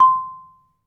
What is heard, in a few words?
MARIMBA; ILIO